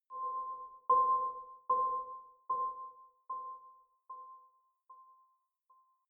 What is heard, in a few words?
sci-fi creepy sonar